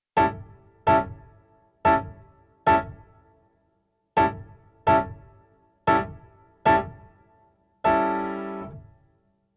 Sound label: Keyboard Pf Blues Pf